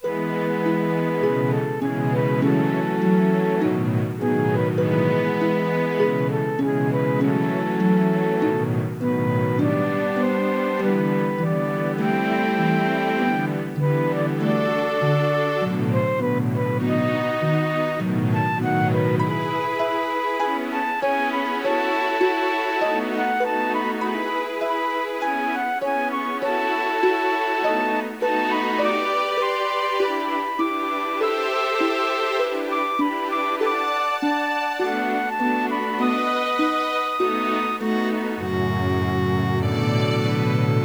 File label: Easy Listening Instrumental